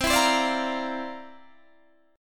C 11th, C, down, Guitar, DADGAD

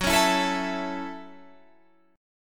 Gb Minor 7th

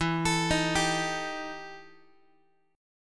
E Minor Major 7th Double Flat 5th E Guitar; arpeggiated; JGuitar

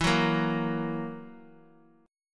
E Suspended 2nd Flat 5th E down

